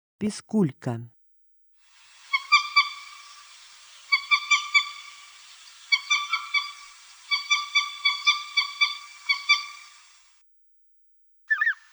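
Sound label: piskulka golos, lesser white-fronted goose voice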